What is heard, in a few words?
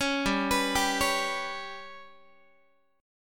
G Major Flat 5th Guitar, chord; GMb5; arpeggiated